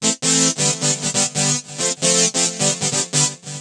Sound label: Keyboard